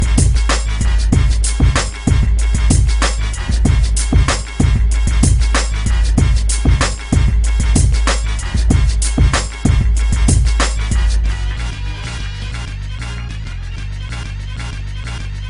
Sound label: Hip Hop Mix